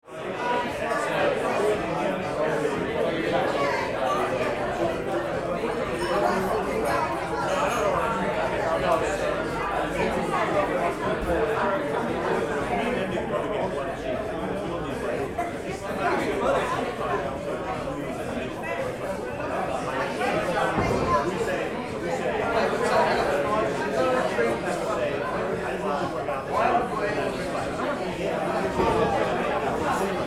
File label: Pub interior ambience lively crowd